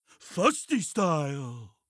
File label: victory drums; BTDB2